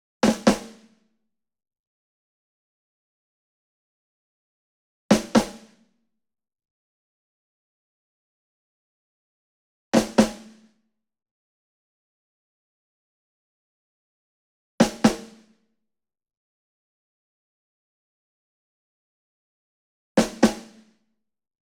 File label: ENSEMBLE SNARE Group Double Near; boom library; Cinematic Strikes Construction Kit